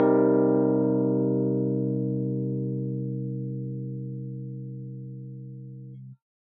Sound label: Open C minor 7 chord